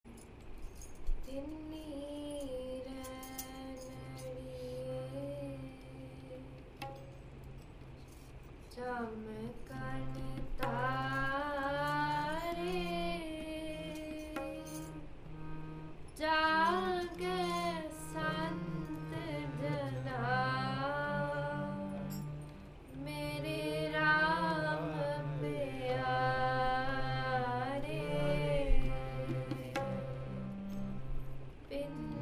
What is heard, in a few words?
kirtan; Toronto Akhand Jaap; bhinnee rainarreeai chaamakan thaarae